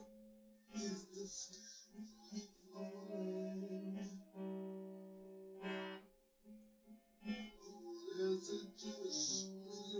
nodrums synthconv; SiSEC10; underdetermined

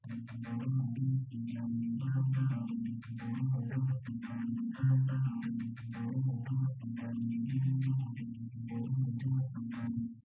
nodrums; SiSEC10; underdetermined

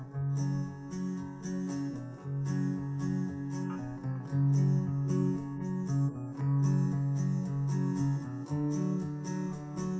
drums live rec 250ms 5cm; SiSEC11; underdetermined

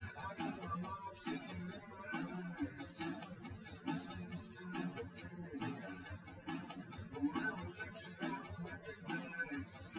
test wdrums liverec 250ms 1m src; SiSEC08; SiSEC underdetermined

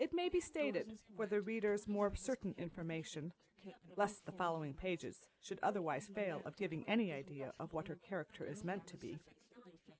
female inst sim

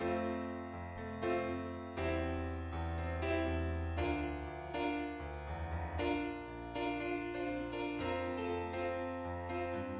nodrums inst src

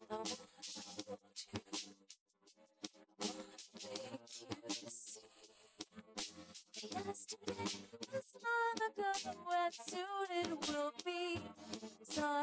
vocals; SiSEC11

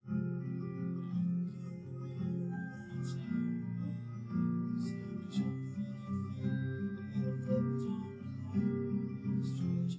nodrums liverec 250ms 5cm sim

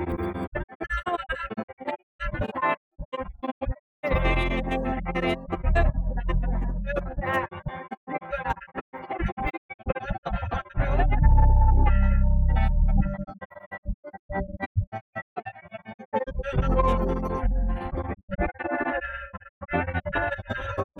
piano

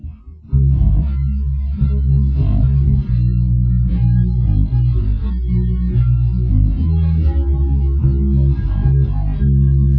nodrums inst sim; SASSEC07

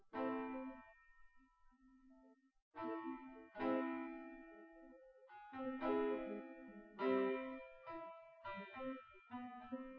nodrums synthconv 250ms 5cm; SiSEC08; SiSEC underdetermined

